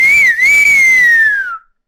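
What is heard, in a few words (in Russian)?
свисток клоунский визг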